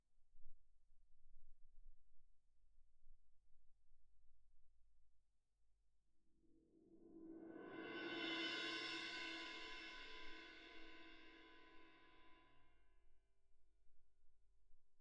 Perc

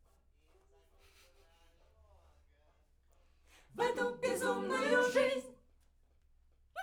Backs; SCHOEPS

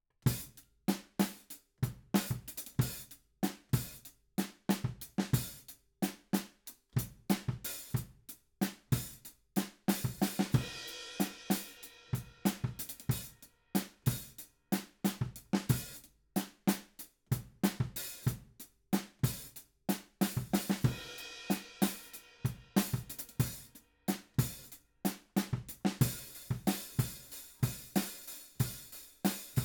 Ride

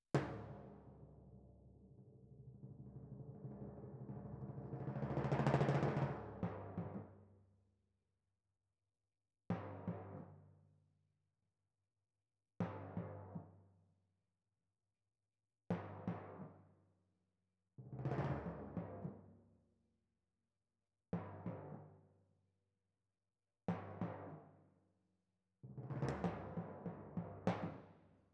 Timp Close

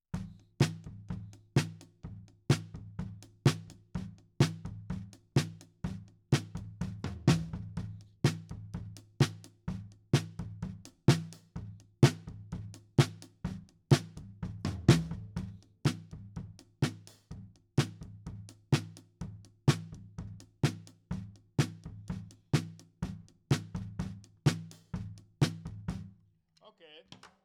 SNR Top

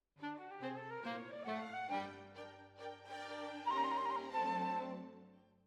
Flute

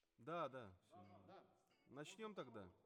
Tenor, RNR for piano

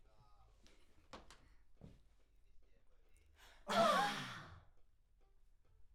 Backs AKG LEFT

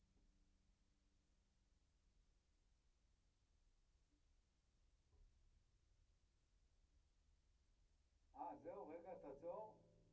C Bass DI

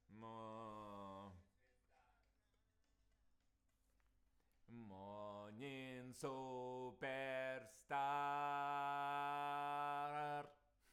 Voc

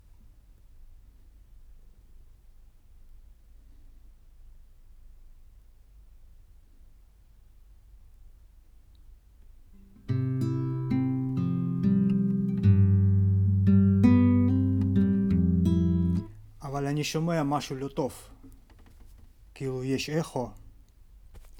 Tape GTR

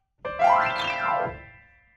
PIANO, RNR for piano

